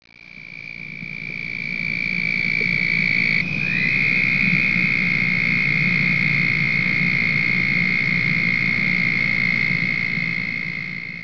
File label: southern toad; frogs toads; wildlife